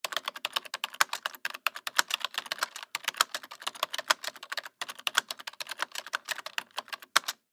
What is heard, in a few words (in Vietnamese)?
Am thanh go ban phim co